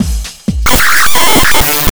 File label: drm 125, drumloops; PS-28 Vocal House Samples; realworx